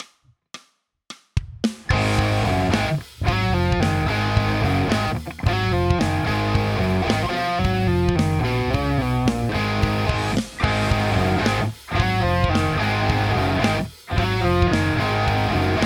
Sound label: Riffery; guitar tracks